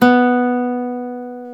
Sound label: GTR Nylon, Roland; Club-50 - Foundations Roland; Nylon Martin